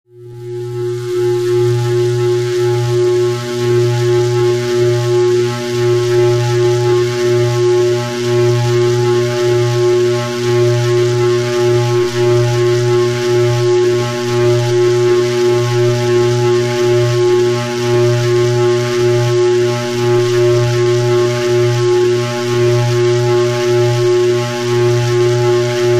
Laser Pulse; Severe Electrical Throbbing And Distortion, Could Be Anti-gravity Machine.; power core hum